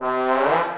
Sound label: Pickup